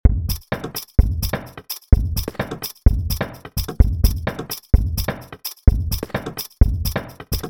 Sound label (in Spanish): ejemplo audio percs